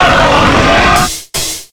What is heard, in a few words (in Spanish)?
Grito de Hippowdon